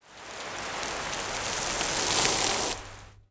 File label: wave 2d